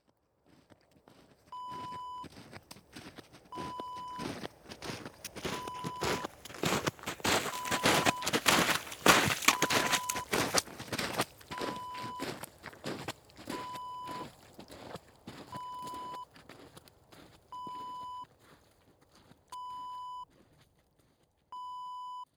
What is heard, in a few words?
footsteps snow pass by